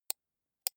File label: computer mouse right click slow soft